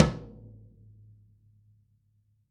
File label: Floor tom